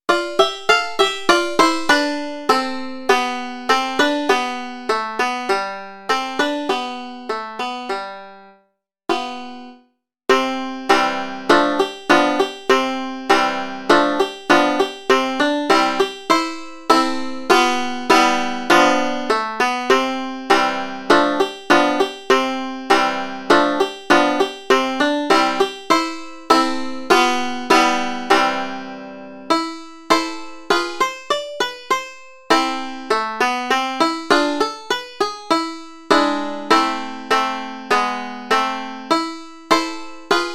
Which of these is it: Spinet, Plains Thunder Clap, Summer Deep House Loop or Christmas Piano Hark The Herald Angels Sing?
Spinet